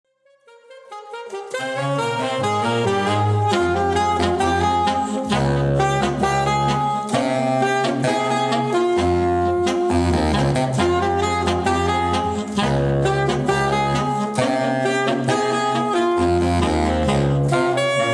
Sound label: Sax